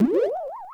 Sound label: Cloud Bounce; New Super Mario Bros. Wii